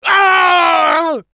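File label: pain crticial death, French; Engineer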